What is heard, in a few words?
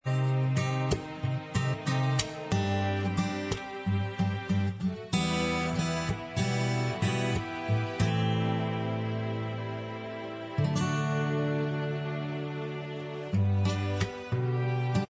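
medley, instrumental track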